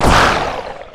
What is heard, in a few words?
death; player